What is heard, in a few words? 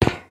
footstep grate